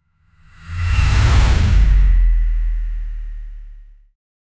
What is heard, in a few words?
superhero goes supersonic, air displacement